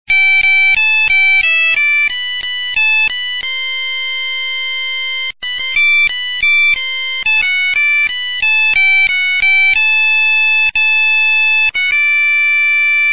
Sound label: wild rover ringtone